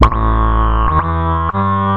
narrow filtered bassline

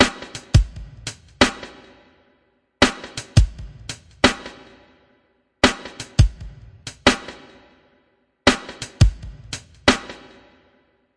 085 bpm breaky subtle snare kick

